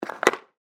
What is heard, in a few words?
Hockey Puck, Shot; Sports